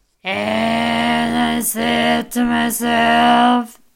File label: Growl